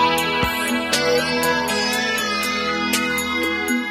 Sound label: Samsung S8 Ringtone; TONO MELODÍA PARA SAMSUNG S8